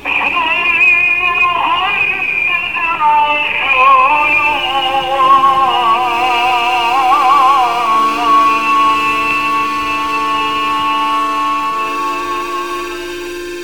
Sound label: adhan